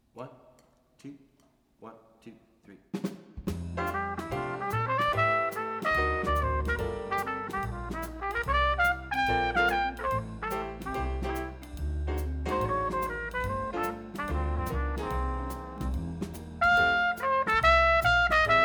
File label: swing lead trumpet